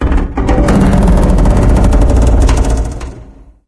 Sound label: fire groan; random amb sfx